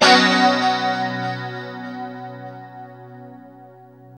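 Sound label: chord B 3, guitars; Spectrasonics; Hans Zimmer Guitars VOL-1; JC-SHEEN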